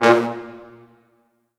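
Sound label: Orchestral Brass, A sharp 1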